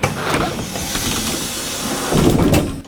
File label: bus door; best service Blue Box